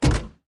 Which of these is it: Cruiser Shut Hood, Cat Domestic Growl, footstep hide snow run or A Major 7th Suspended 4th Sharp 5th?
Cruiser Shut Hood